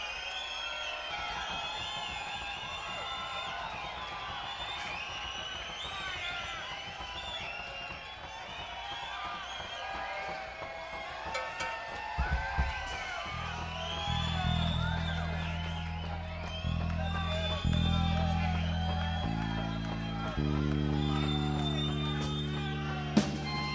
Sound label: Crowd; Boulder, CO; Boulder Theater